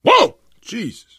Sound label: reaction startled, player survivor voice biker; source engine; left 4 dead 2